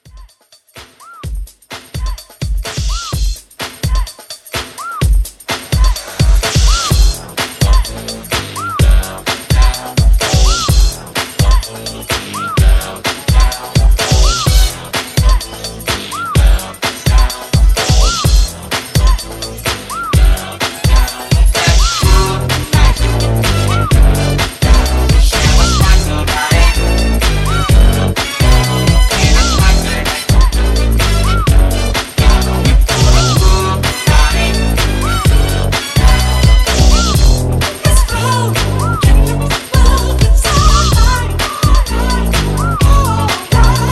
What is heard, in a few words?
Mashup Re Drum CLEAN